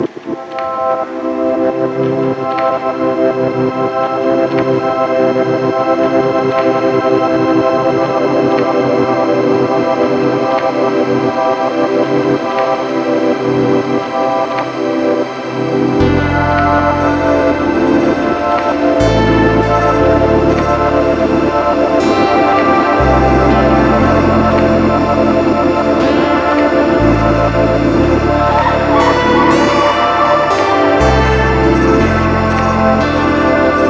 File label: ambient sketch; weeklybeats-2020